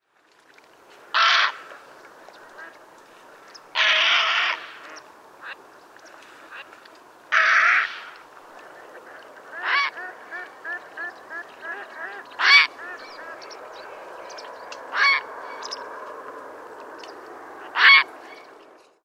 volavka popelava

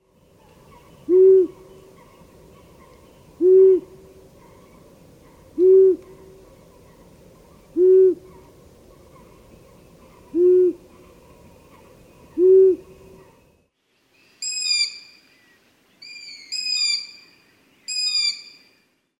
kalous usaty

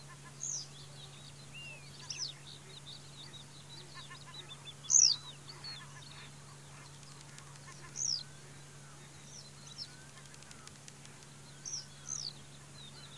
konipas lucni Motacilla flava

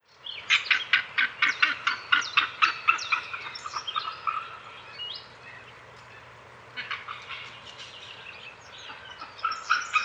orel morsky Haliaeetus albicilla

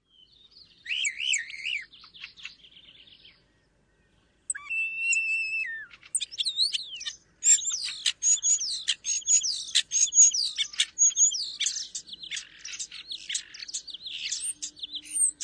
spacek obecny

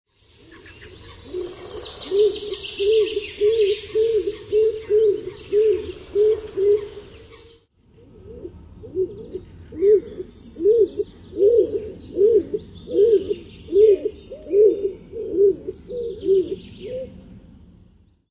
holub doupňák

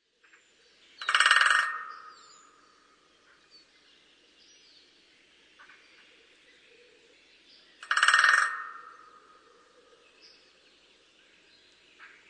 strakapoud velký

